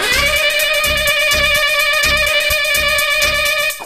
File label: long sax